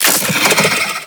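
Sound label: Wall Eye Detach A; Warframe; Sentient Devourer; Ambience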